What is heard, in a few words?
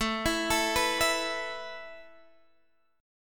A Suspended 2nd A Guitar Half Step Down; Asus2